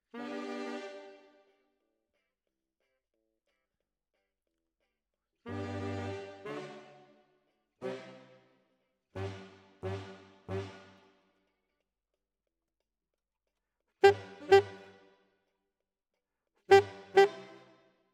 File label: Alto, RNR for piano